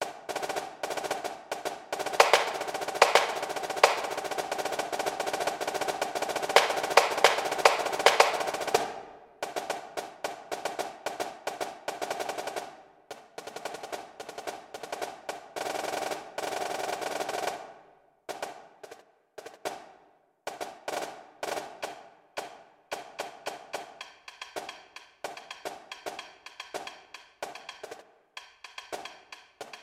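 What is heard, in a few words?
drumline snare drum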